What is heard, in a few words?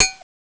COW PITCH; CMI